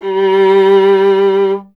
STR VLA, Solo Instruments

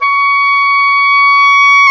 oboe, mf f; Roland L-CDX-03 Disk 1; Oboe 2&3

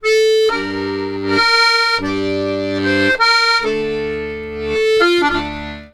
Accordions; USB Soundscan vol.40; AKAI

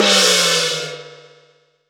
HuYinLuo Lo Open; Cymbals

